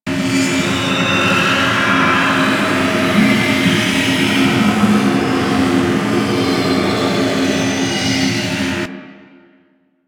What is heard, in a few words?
Gas Pillar Loop; Warframe; Corpus Gas City